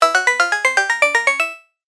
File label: climb long